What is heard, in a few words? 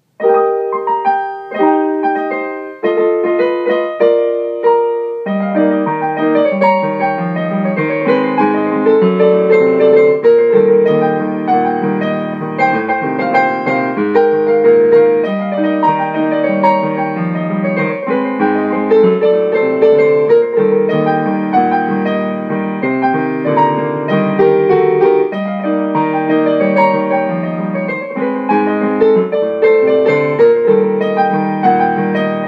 The Jazz